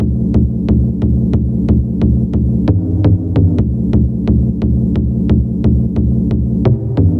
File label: Synth 133BPM Dark Analog